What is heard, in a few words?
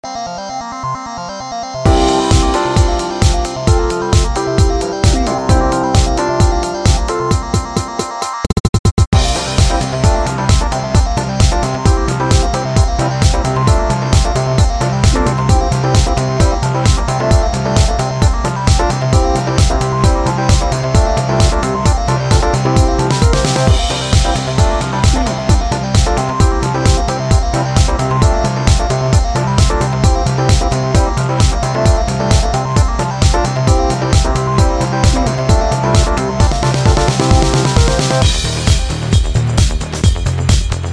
fist pumper bed